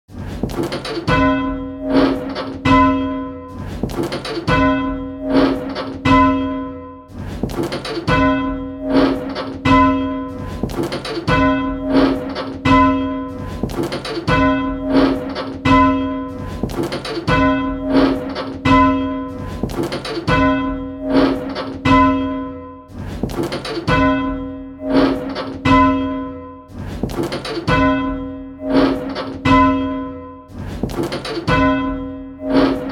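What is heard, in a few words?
bells, 8 Bell Games; Just One Bell; Pebworth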